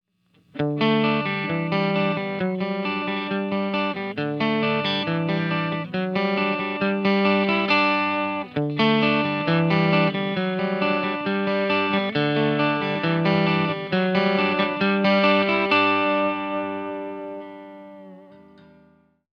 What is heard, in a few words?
Center Clean